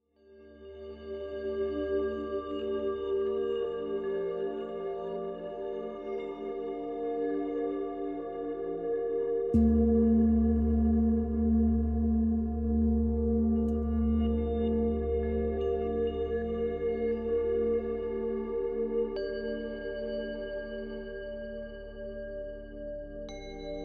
Deep Meditation

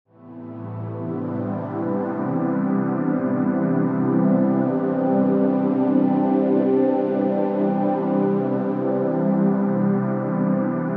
Deep Relaxation Soundscape

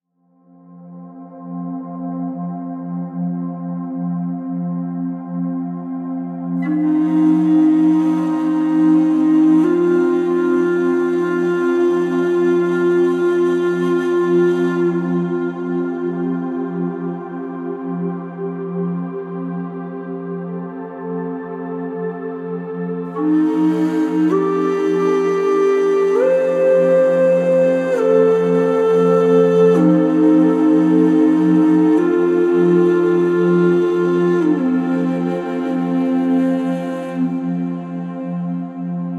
Healing Native Flute

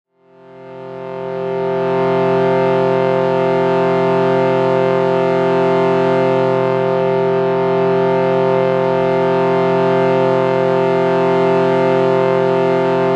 Shruti Box C F 440Hz

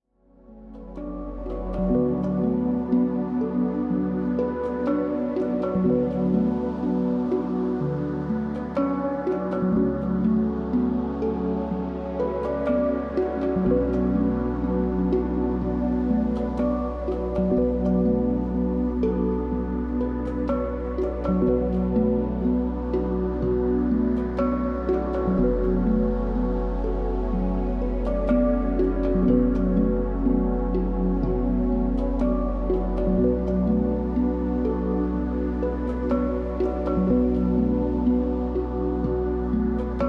Deep Relaxing

